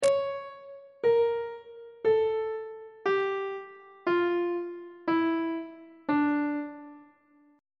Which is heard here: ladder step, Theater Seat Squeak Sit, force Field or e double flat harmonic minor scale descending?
e double flat harmonic minor scale descending